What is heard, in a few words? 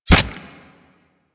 Kunai Impact Flesh; Warframe; Hit Effects